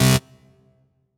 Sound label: Dance Mega Synth; Best Service; 90 s Sample CDs